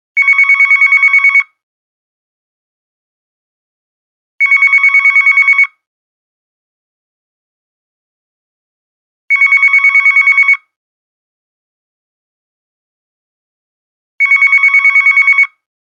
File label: regular phone ringtone